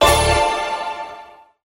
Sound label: piano strike; Notes